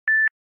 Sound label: Beep Once; Samsung; Z4